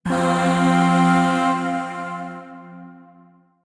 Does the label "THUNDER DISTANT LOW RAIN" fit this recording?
No